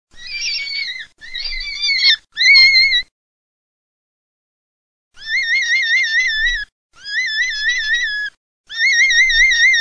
CONIGLIO

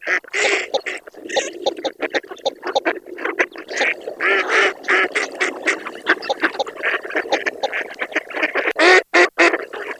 MESTOLONI

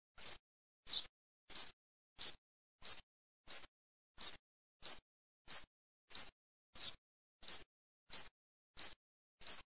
BECCAMOSCHINO

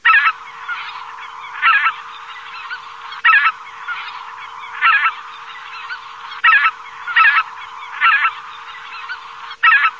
oca lombardella, birdsound

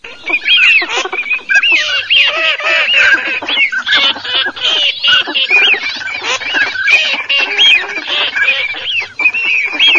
fischio codo alzavola, birdsound

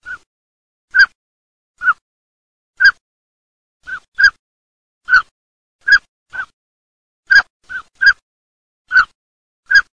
CODONE

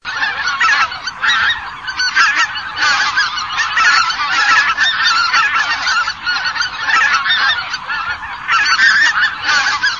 OCA GRANAIOLA GRUPPO

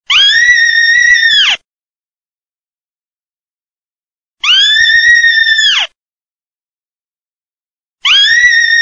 CAPRIOLO

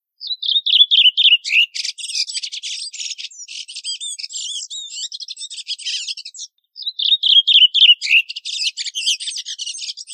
Tordo sassello

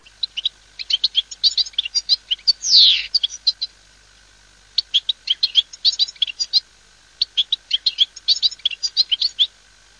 cardellino

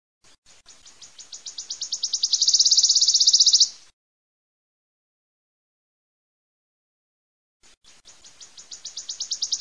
LUI VERDE

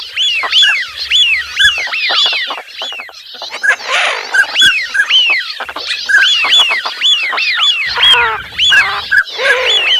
FISCHIONE CODONI

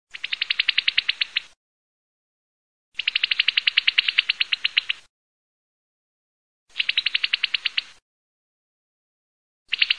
FRANCOLINO DI MONTE

